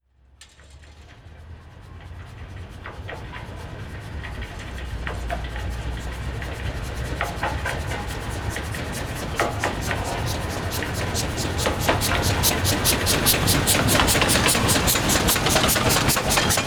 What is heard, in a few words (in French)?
trains a vapeur a voie etroite; sonotheque